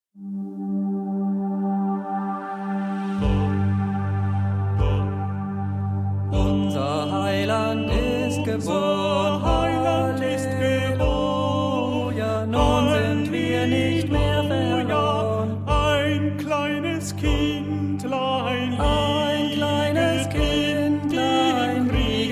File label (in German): unser heiland ist geborn maennerquartett